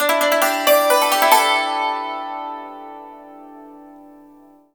YANG CHING, Heart of Asia; Spectrasonics

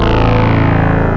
BASS ORG; KEYBOARD; M8; CMI Factory

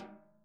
SNARE, Interactive Drum Kits; Sonic Reality; ID SNARE 3+

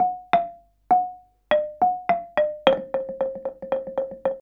KULINTANG; Spectrasonics; Heart of Asia; KULNGTANG PH

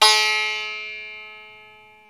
SITAR, Plucks; Eth Plucks, Pluck Duo

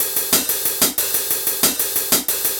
Hi Hat

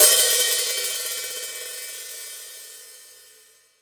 Real Drum Kit, JUNGLE KIT; Best Service ProSamples vol.43; Real Drum Kits

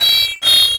MET SW SIN, SWORDSING

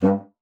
G2, bassoon; Xsample VOL-6; C BASSOON

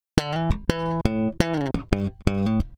Electric Bass

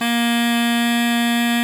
kortholt A#2; SoundBytes - Early Patches

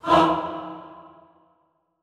HAH E2, Classical Choir, SHORT HAH; Best Service

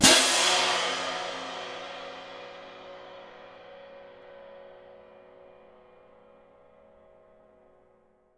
CYM, Percussion; Miroslav Vitous - Percussion & Harp

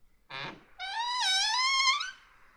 Door creak

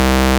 P5 SQR, Vintage; INIS - Vintage

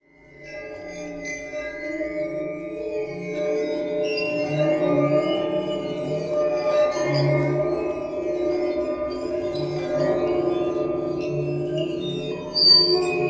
Waterphone Bow; Sonic Foundry (Sony Creative Software) - Ambient Atmospheres & Rhythms; Ambient-Metals

